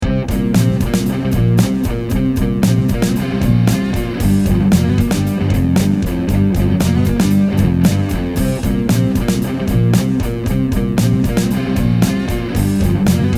guitar parts

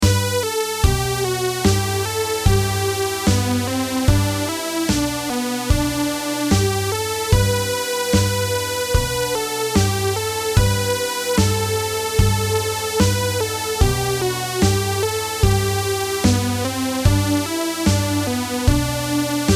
Mixer Orch; Oklahoma Mixer

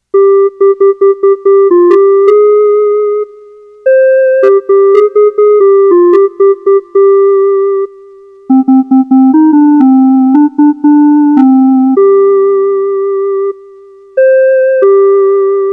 instrumental

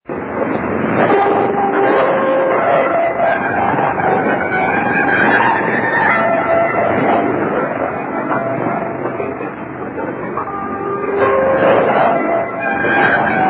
4750KHz; RRI Makassar

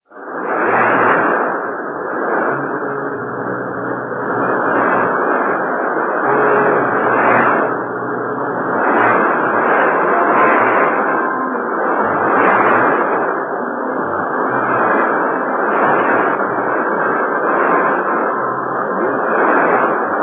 Lokalradio 9290KHz; Humburger

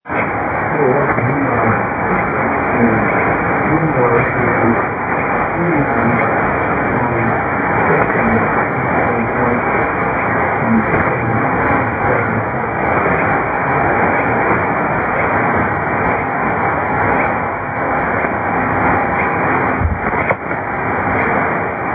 5050 kHz

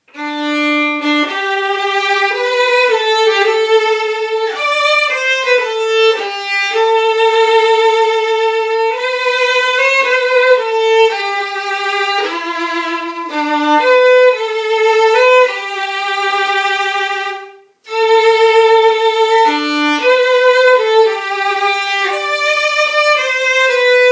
violin; 猪高中学校校歌

